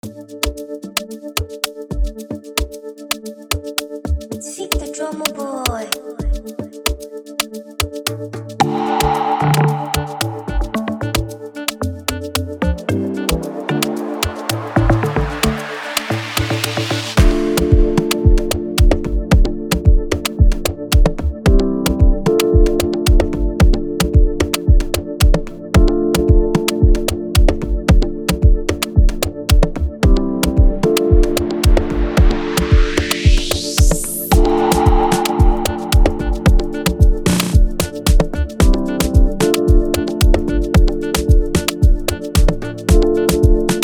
Rain Amapiano Type Beat; Prod By VDADrummerboi

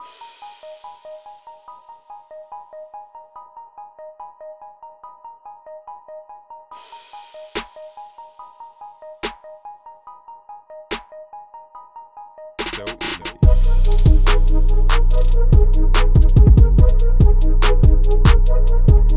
Hip Hop Trap Freebeat; Prod By Kingsol

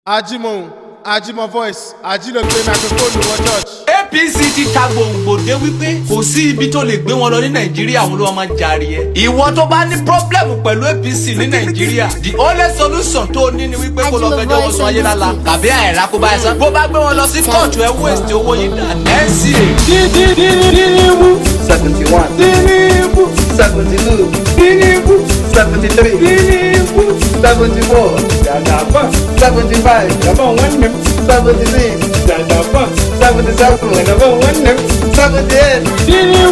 Beat Drums; Tinubu Jagaban; Prod By Ajimovoix

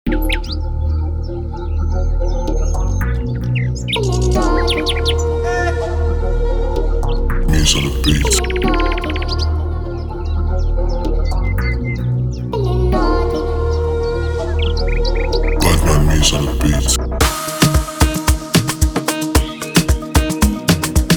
Amapiano Freebeat

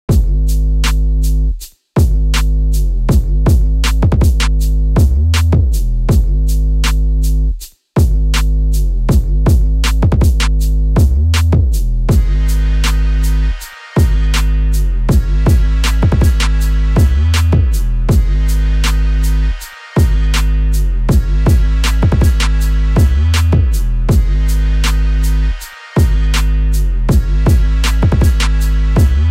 Modern Hip Hop Beat; Prod By Kalu Samuel

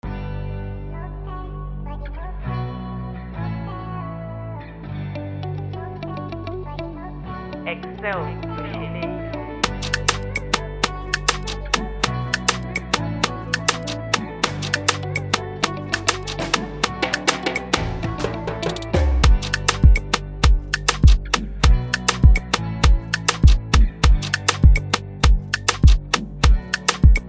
Freebeat Afrobeat And AfroGospel Type Beat; Prod By XL Beatz

